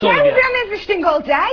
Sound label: string